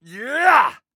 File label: Assassin Grunt Physical; Six-Shot Cyborg; Realm Royale